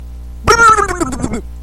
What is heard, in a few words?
bubble